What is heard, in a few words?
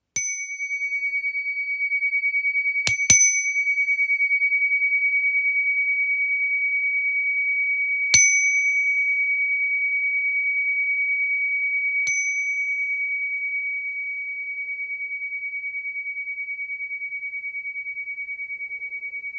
Chime; cakra 5 grlo